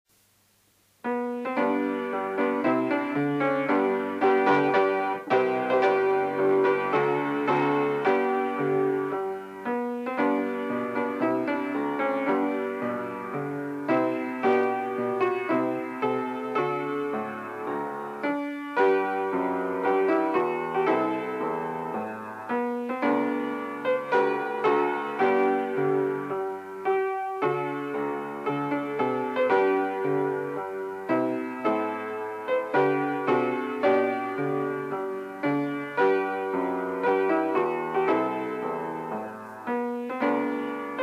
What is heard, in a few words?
melody